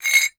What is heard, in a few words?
Metal Slide